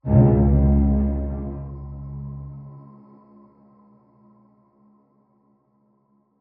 Drone - C#m 90BPM; Construction Kits; STEMS; Ghosthack; SkyRealm; Kit 13 - Wanadeers Mist

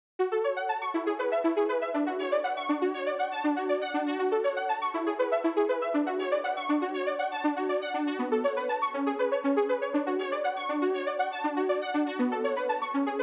120 BPM F# Min Arp; Melody Loops; Escalade; Retro Collection; Cymatics; ANALOG EVOLUTION Beta

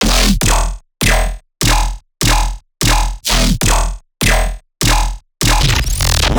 Bass Shot E 150, dubstep; Dubstep Basses; Loops; GhostHack; Advent Calendar 2021

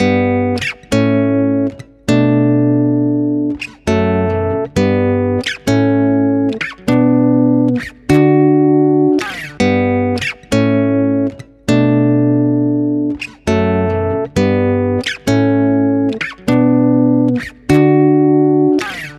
Guitar 100 BPM (F#maj); Fame; Ghosthack; Guitar Loops & Shots

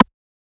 Vintage Percussion, Tiny Rim; Cymatics; Mystery Pack Vol 4

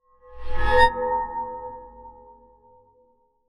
Whoosh; Whooshes

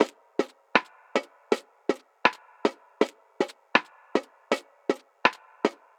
Found Perc Loop - 80 BPM; Cymatics; Fiji Hihat Loops & Percussion

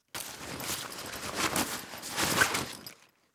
Quick Bag Load Zipper Close; Fairlight Sound Lib

